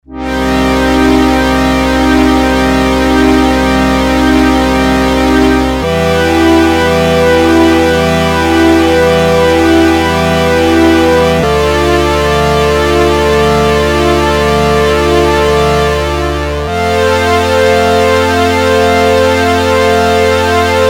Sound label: Roland JX03 Organpad